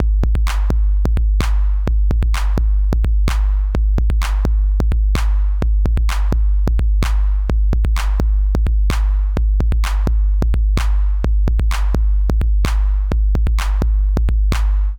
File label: Chandler Limited Zener Bender Hardware 808A LIMIT; Softube